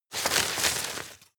rustle hard short; berufe; foerster

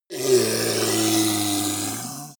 ogre grunt painful short; kreaturen

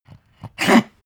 pug gag smack short; natur-tiere; hunde

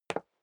step high heels wood parquet single stair down; schritte

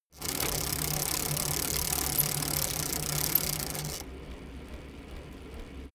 bicycle rickety chain pedal roll short

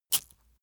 metal pouch impact rich

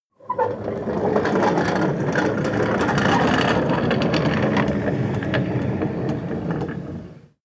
slide wooden door squeak

